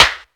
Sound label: piercing steel snare drum b key